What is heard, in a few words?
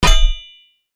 metal pipe hit